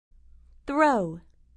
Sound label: throw